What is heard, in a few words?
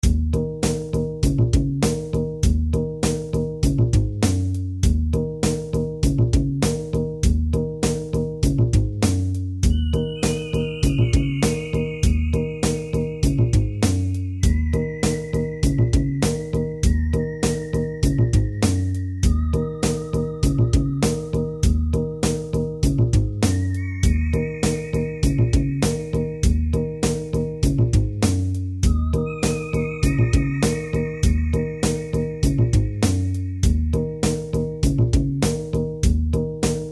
Music Upgrade Music Upgrade; Into Space